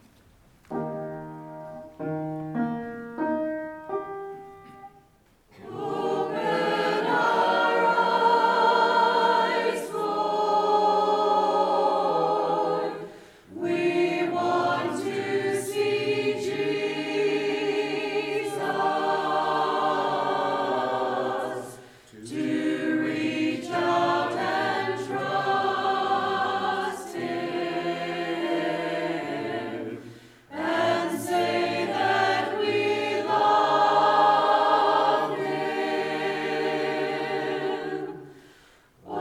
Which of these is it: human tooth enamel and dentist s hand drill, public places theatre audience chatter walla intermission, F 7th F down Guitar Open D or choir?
choir